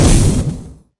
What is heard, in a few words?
fireball cast; Magic; magic spells